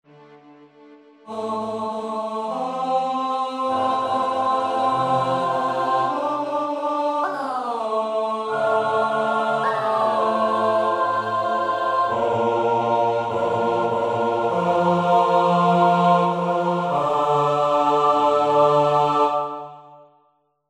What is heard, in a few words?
Chorus All